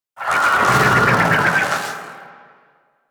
Vocal Death; Warframe; Sentients; Conculyst

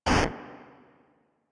Corrupted Notes B; Warframe; Bard Quest; Bard Boss Fight

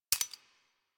Dual Soma Mech 2D A

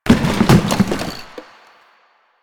janitor cart impact

